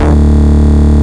Bass D, Root D

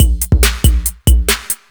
Breakbeat; Vengeance Samples; VEC2 Loops

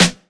Snares & Claps; Vengeance Samples

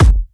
Hard Kick; Vengeance Samples

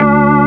Synths C; Vengeance Samples

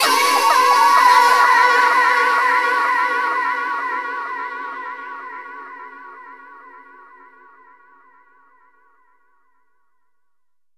Reverb Athmospheres, FX Vocals; Vengeance Samples

